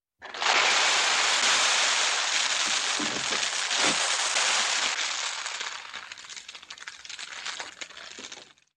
zvuki kofeinykh zeren

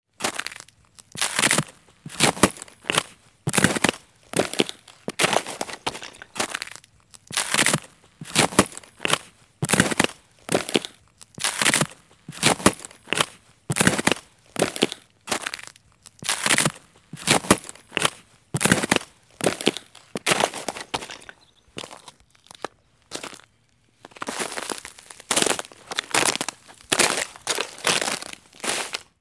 zvuki shagov po ldu

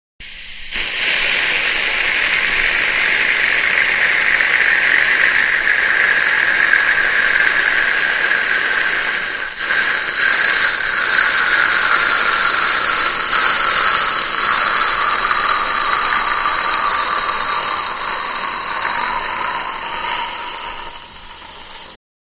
zvuki sliunootsosa

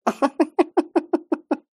zvuki zhenskogo smekha

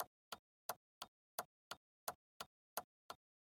zvuki povorotnikov u avtomobilia

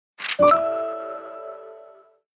originalnye ringtony i uvedomleniia nokia asha 302

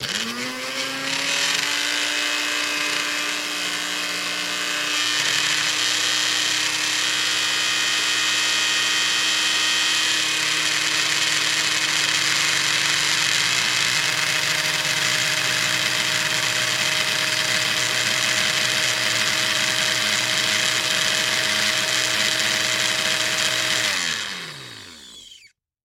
zvuki blendera i sokovyzhimalki

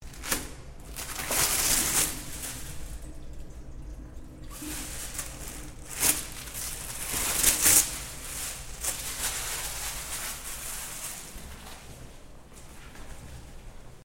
zvuki sidenia dlia unitaza

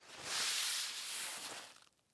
zvuki vafelnogo polotentsa

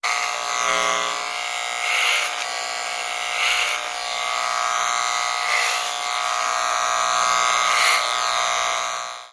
zvuki mashinki dlia strizhki volos

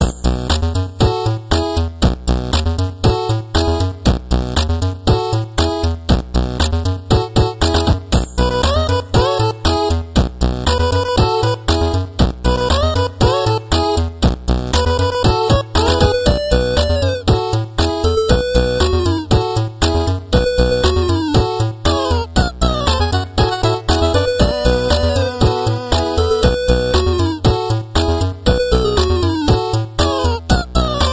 8-bit muzyka na sotovyi telefon ringtony

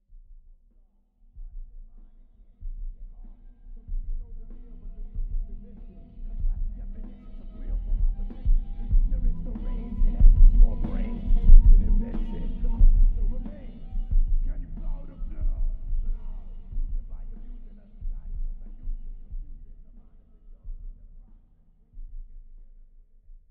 zvuki muzyki iz proezzhaiushchego avtomobilia

